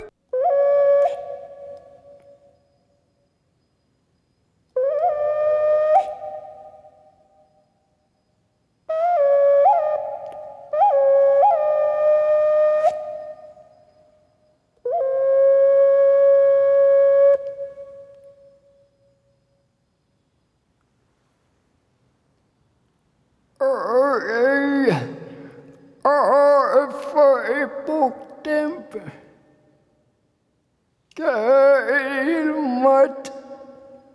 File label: ocarina voce